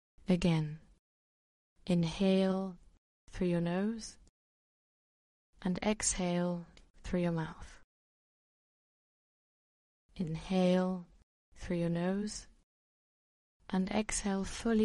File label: inhale and exhale; Panic Breathing